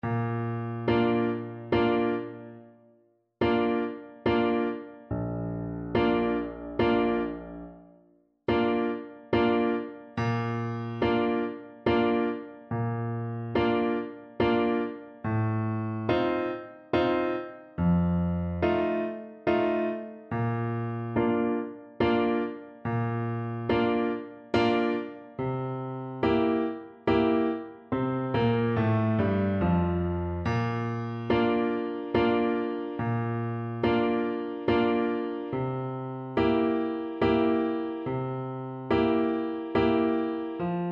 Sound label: saint saens elephant, French Horn